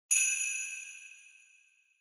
Sleigh Bells Reverb Shake Hard

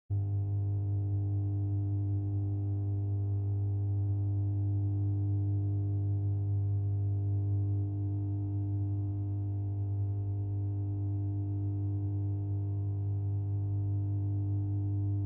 Fluorescent Light Noise Hum

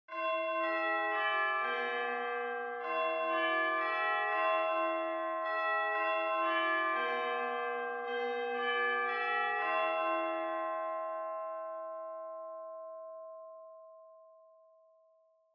Japanese School Bell (Far-Fast-Long)

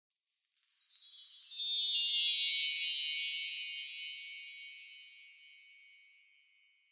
Wind Chimes, Reverb Down High

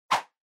Motion Swish; モーション・スイング

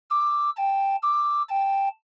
Ambulance Siren Fake High

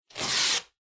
Duct Tape Peel Off

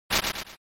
SNES RPG Attack Boomerang

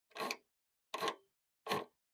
PC Hardware DVI Screw

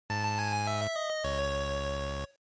Miss, bgm

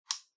Portable Radio Turn Off

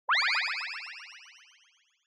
Motion Blow Away Delay Up

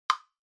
Woodblock Mid

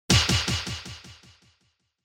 Hit Punch Delay

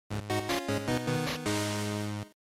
GB Racing Game Over

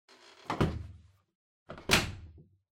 Washer Front Load Door Close

